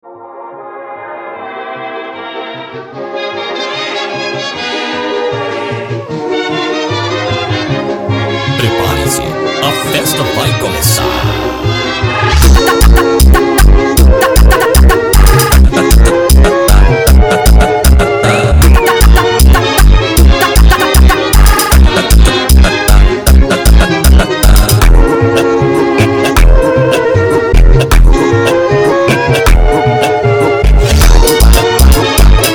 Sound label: funk sped up